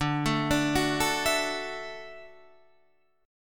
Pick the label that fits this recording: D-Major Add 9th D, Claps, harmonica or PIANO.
D-Major Add 9th D